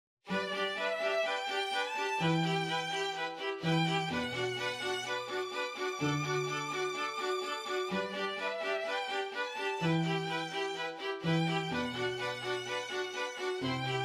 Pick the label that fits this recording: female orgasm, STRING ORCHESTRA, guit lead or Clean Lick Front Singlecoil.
STRING ORCHESTRA